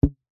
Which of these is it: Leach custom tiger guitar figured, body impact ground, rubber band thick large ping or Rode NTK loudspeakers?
rubber band thick large ping